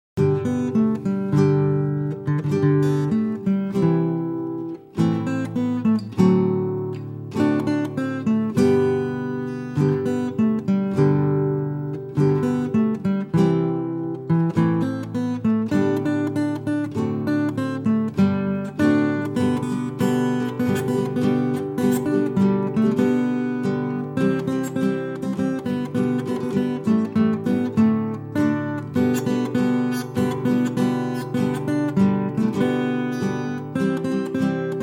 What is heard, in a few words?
holiday jingle bells; w/ Bells